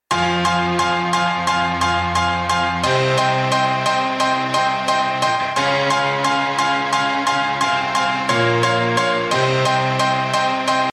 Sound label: piano